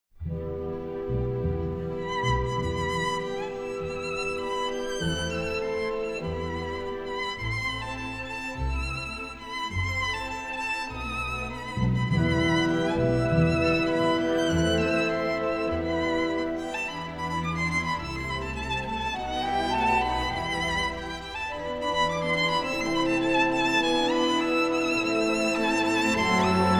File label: Violin; Mendelssohn Violin Conce